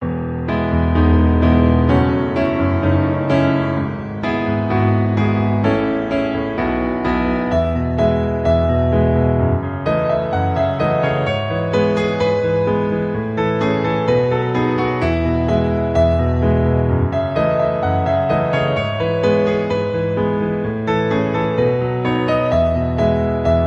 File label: Piano Cover